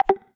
record pause, ChromeOS Sounds

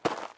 gravel step; Golden Crayon Sounds

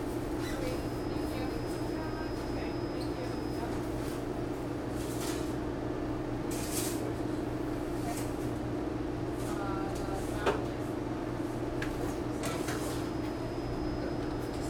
pizza shop; Golden Crayon Sounds

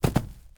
grass land; Golden Crayon Sounds

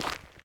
dirt step; Original TK Sounds

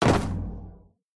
metal land; Golden Crayon Sounds

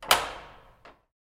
door open; Golden Crayon Sounds

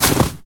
snow land; Golden Crayon Sounds

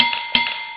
extra tank; RS Games Sounds

